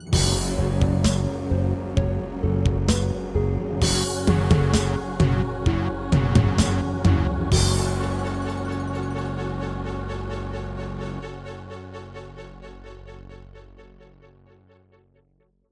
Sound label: Casio CTK-800; sigil doom episode 5